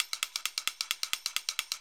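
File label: clacker; ILIO - Ethno Techno; RAVE COW 132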